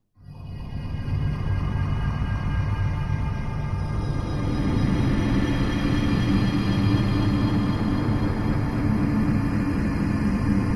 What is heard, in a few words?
Doom base rumbling with updrafts of pitch and tone